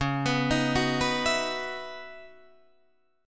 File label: C sharp Minor 11th C sharp, Guitar Drop D